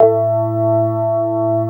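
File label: CX3 organ, Keyboards Old School; USB Soundscan vol.09; AKAI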